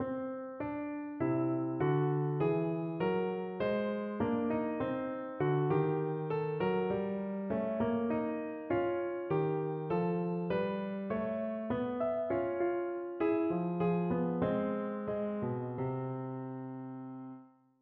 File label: Bach Diesel Canon